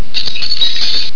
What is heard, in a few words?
GLASS; DOOM WADS; T1000